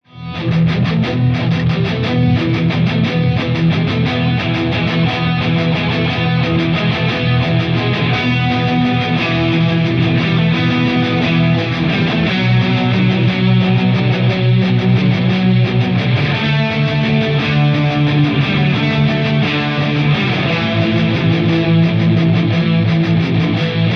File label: guitar version ringtone; android; got